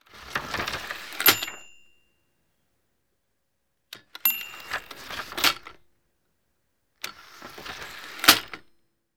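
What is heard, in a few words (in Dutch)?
papier schrijfmachine bel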